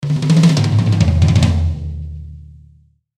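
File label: Musical Element, Drum Snare March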